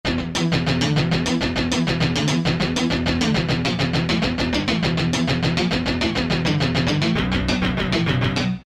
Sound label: Alesis QS8, Chug; Total Chug; Factory Preset examples